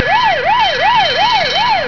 siren; Wired for Sound Pro CD; Aristosoft, Inc.

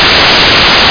JACKPOT

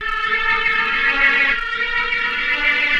synth, Drum+Bass, 160, C; Keys

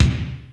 BASSDRUMS; DRUM SOUNDS

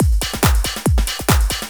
drums; flash tutorial

